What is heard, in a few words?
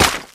impact weapon small mud; collide; small-weapon